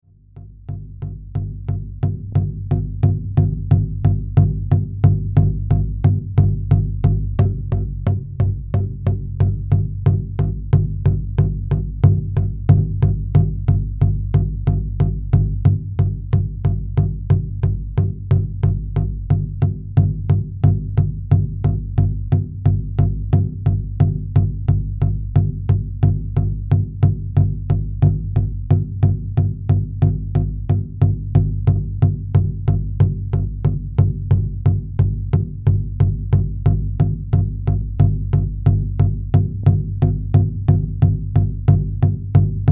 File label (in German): trance trommel; trance trommel vol 2